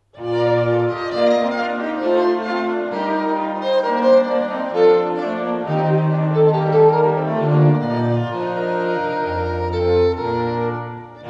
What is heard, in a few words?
hornpipe; purcell